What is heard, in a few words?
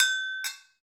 Brzl Agogo; Club-50 - Foundations Roland; Agogos; Fiji